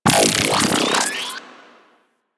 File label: Shockwave Electrical Aura; Warframe; Venus; Venus Terra Shockwave Moa; Abilities